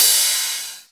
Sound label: Rides, Cymbals